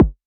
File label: Multi-Kick Short